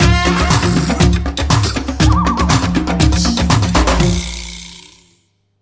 STAB

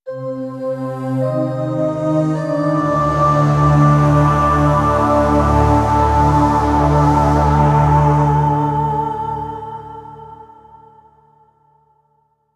Round Start; Zombie Shi No Numa - Shino Round Start 02 Alt; Call of Duty Black Ops III; PS3; PS4; Xbox 360

